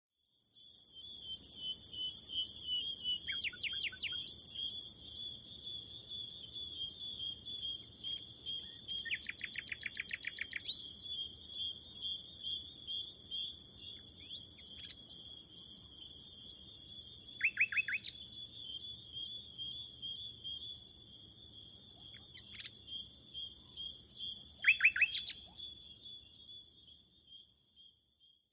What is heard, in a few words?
Evening Forest Birds and Stream Song